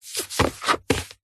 book thick hard back cookery touch pick up put down; zapsplat